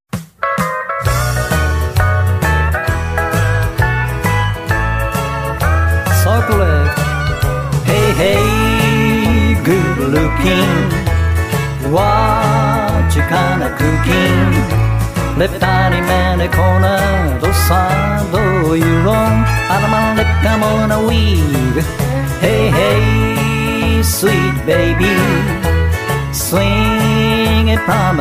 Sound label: vocal